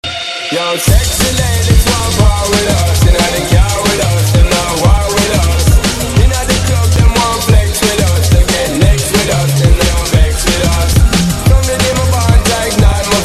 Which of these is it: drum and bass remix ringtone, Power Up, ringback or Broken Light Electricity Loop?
drum and bass remix ringtone